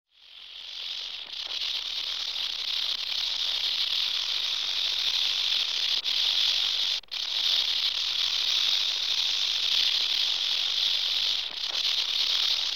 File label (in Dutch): Ratelslang Geratel; KMP Film Video Sound Effects